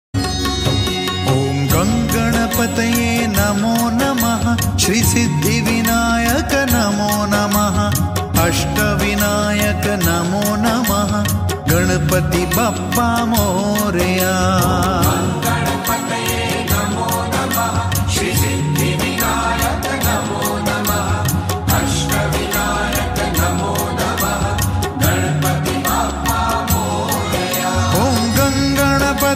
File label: Bhajan Song Ringtones; Devotional Ringtones